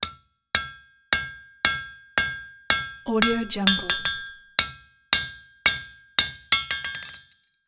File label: forging metal on anvil